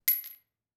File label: Falling Bullet Pistol